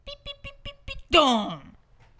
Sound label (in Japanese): 時限爆弾の音; サイコブレイク